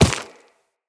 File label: Run Dirt, Footsteps; Characters; Operator